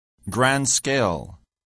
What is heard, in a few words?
grand scale